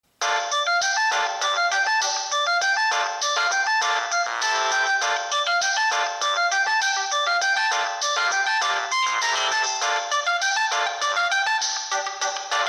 Jig Nokia6020

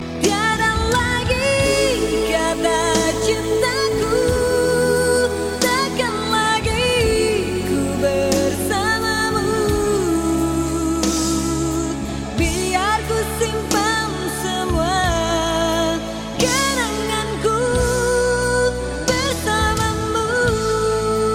Indo Song

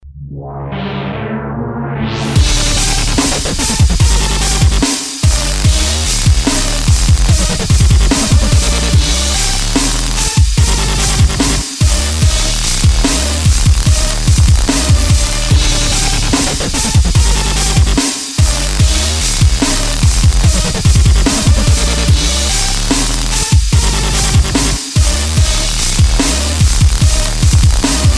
Punch Dubstep; Snooki

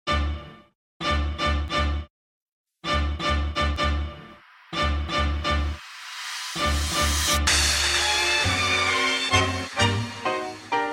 Hip Hop

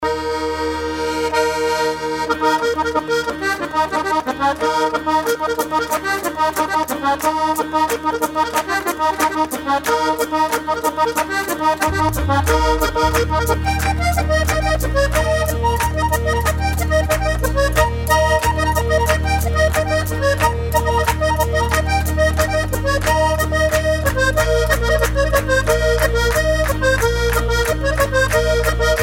Circassians Music

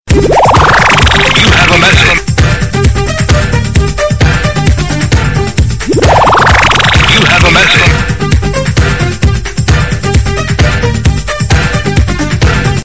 Remix Sms

